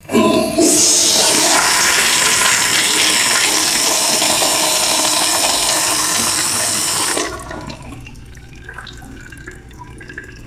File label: liquid stream splashing into toilet bowl